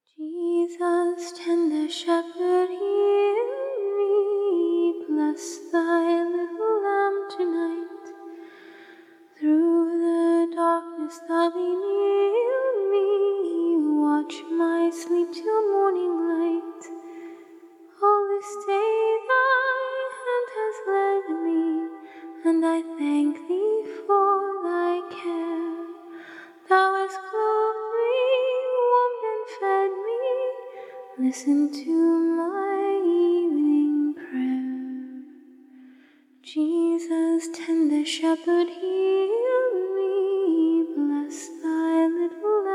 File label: acapella hymns